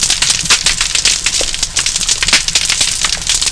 shrimp

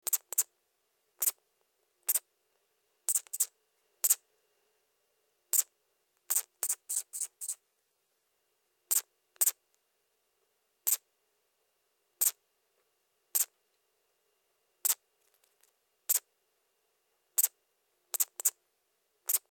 northern true katydid female

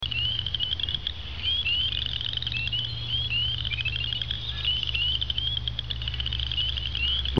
Pseudacris triseriata

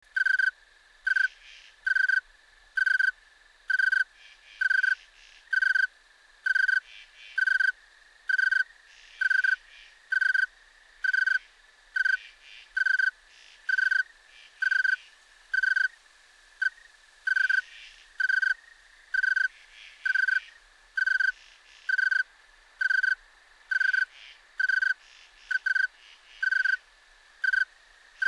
snowy tree cricket

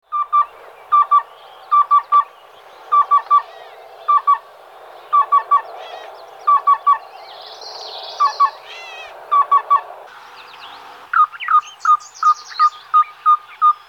black billed cuckoo

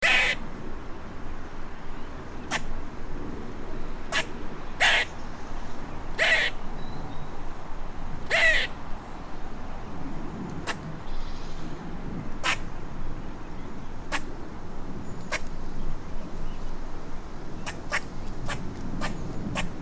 gray squirrel

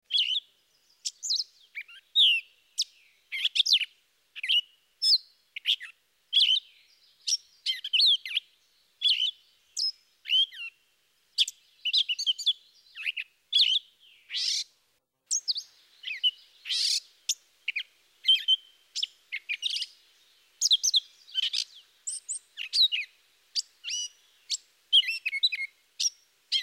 gray catbird

